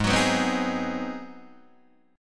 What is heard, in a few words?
Ab 7th Sharp 9th Guitar Open D, chord